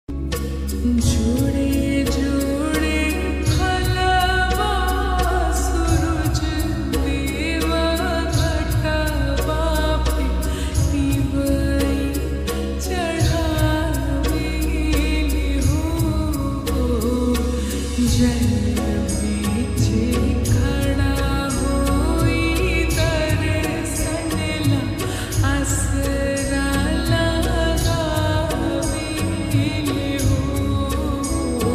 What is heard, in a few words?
song ringtone